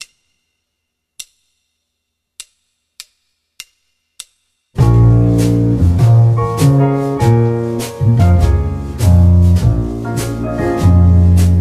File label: gm7 alto